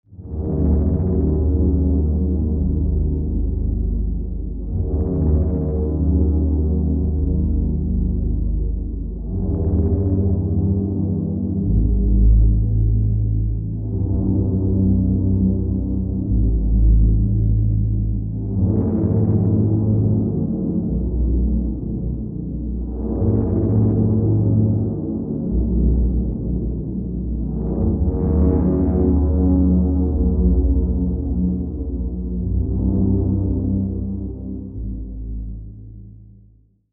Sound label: Transition; The Heilwald Loophole